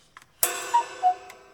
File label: complete